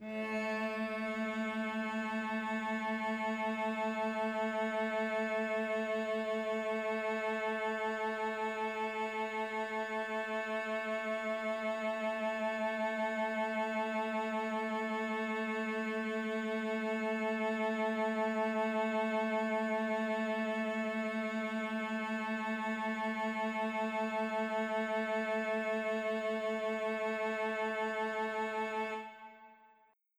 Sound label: Cello A